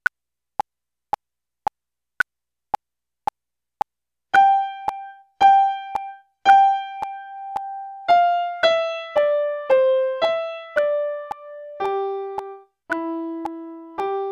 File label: Trumpet Voluntary Primo